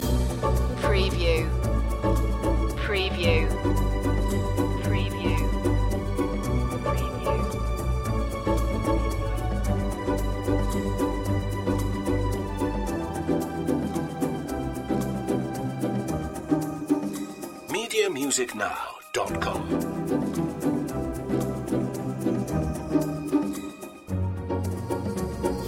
opera loop

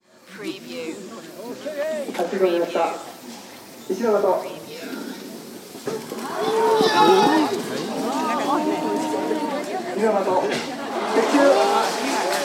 yabusame festival crowd react Kamakura Japan

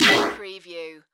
Blast Warble Fast; Space / Science Fiction (Sci-FI)

